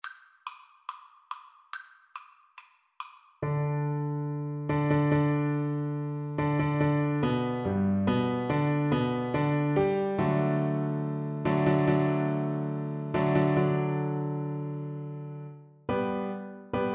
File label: horn, French Horn